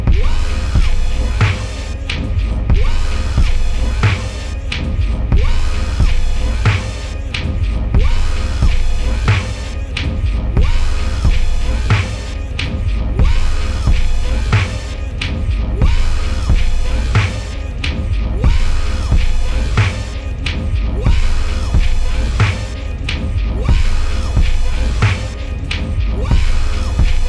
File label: loop